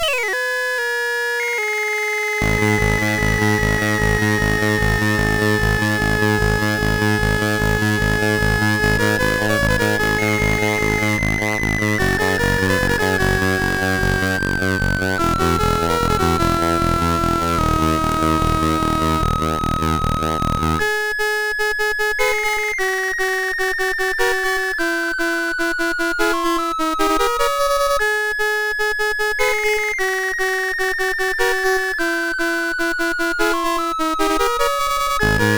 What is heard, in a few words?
X-Mas, MOS6581R4; Bain David